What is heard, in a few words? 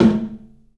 TOM, 3D Drums, CLUB TOM; 3D Sound; CLUB 08TOM